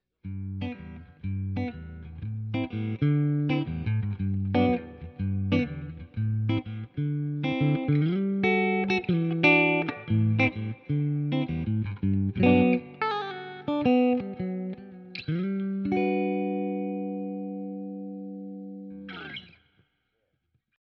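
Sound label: P90 Soap Neck, guitar pickup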